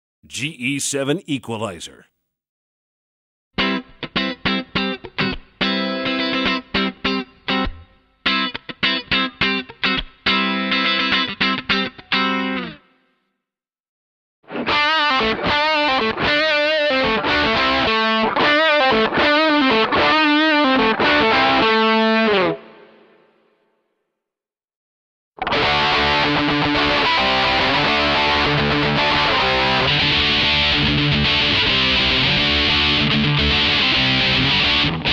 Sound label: GE-7 Tone